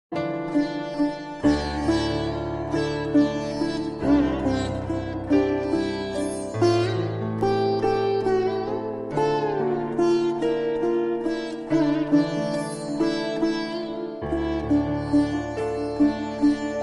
rabindra sangeet ringtone instrumental love tone best music tone